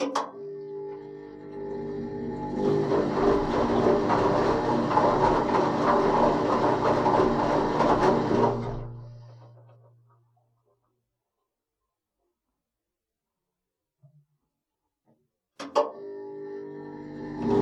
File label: Start Stop, Wash Spin Cycle, Washing Machine